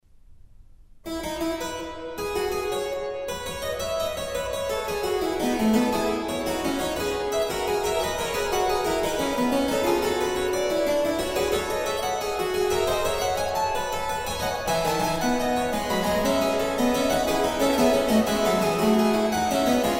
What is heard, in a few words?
Fuga; Fuga 11; BWV 880